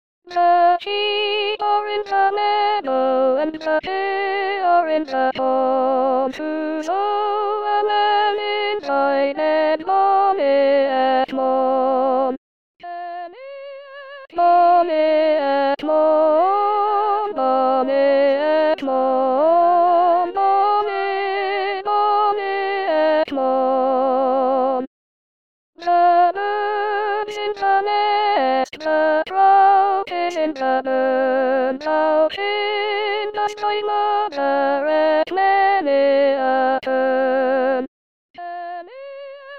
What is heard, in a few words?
soprano